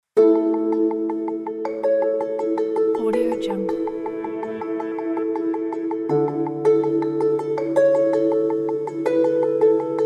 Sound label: happy moments gentle acoustic background